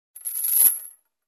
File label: Glass tinkling